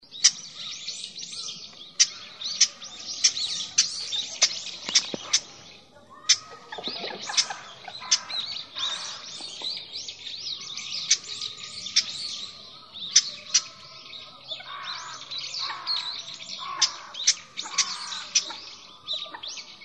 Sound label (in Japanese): uguisu